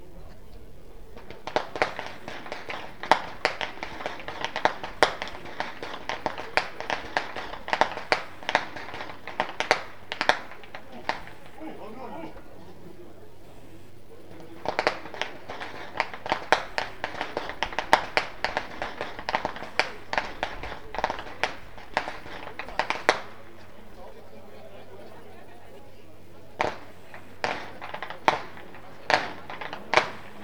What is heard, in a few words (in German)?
schnalzen, flachgau; fuerstenbrunn